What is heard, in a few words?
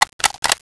Tommy clip out, weapons; dod